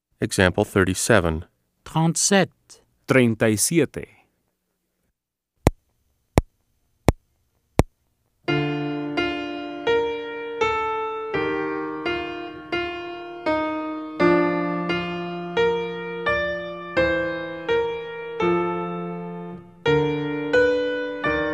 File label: Keyboard